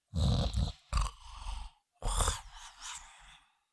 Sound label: Cartoon lion voice snore snoring